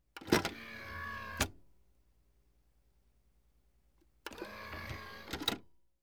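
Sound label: disc being inserted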